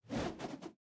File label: staff spin; Smite; Baron Samedi